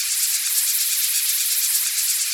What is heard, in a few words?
Catherine Wheel Loop